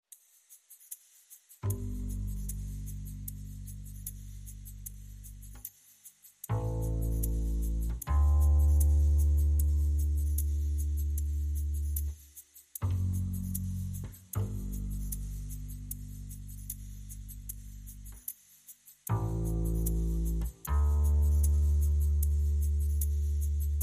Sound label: Percussion and Bass Only